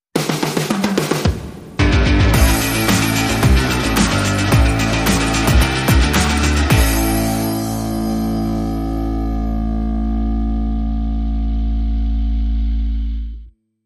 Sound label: Biker Groove, Sting